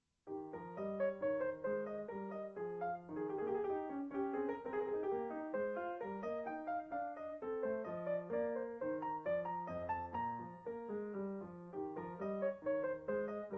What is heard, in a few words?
piano; BachMenuet